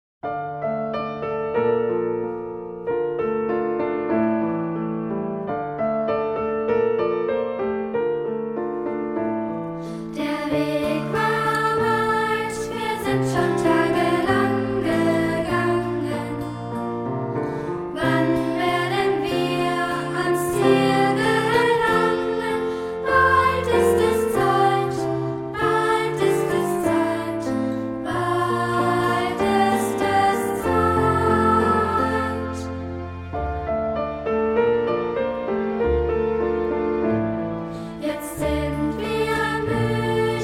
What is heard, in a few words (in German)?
Chor